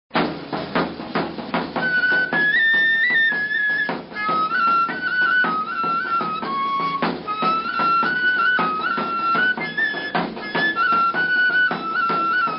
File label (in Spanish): charro aliste